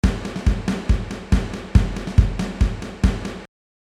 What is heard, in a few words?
Drummer